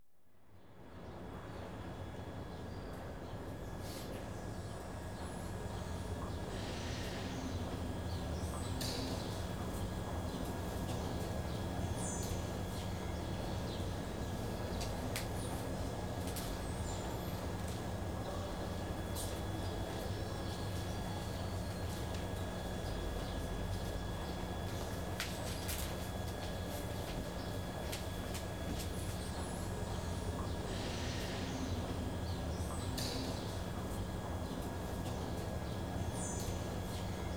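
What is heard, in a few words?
London Underground Trains Atmosphere; BBC Sound Effects Library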